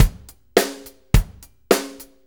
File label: 4-4 Loop, Drum Loops, Full Kit Loops; Drum Tools